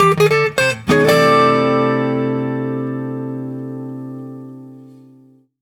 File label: Jingle Finish; clubhouse games 51 worldwide classics 2020 switch gamerip